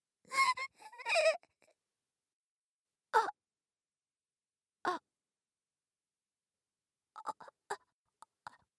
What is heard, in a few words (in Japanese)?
胸を刺す